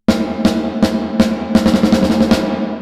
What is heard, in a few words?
Reverb Compare Snare Rev Plate 140